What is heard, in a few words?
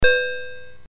BASIC sid MOS6581R4, GAMES; Sword of Fargoal